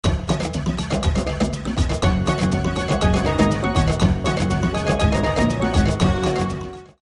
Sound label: African Ringtone